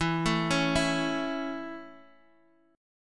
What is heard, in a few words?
A Major E